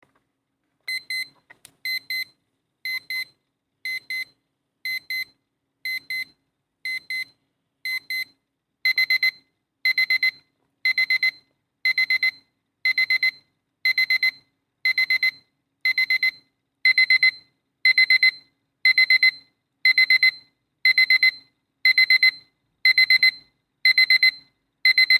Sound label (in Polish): pokaz wzmacniajacego sie dzwieku budzika